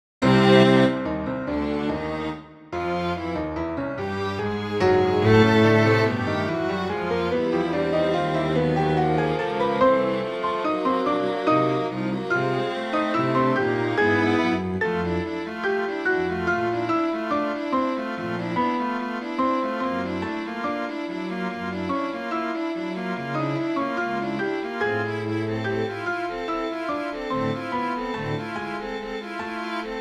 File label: Quartet, ensembles 2 or more instruments; Nibley